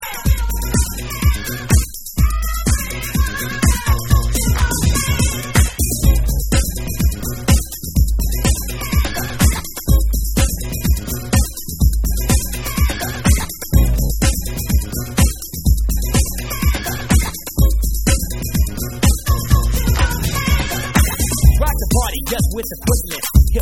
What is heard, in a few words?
Hip House Club Mix